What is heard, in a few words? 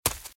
Footsteps Leaves Sprint Right; RadwinIsland